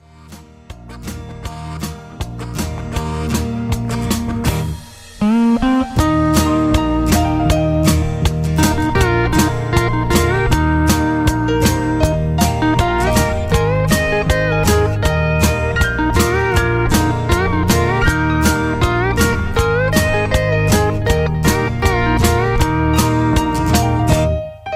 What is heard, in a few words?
Instrumental Two Step